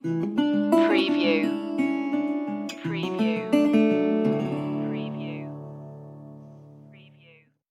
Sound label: red fern grows stinger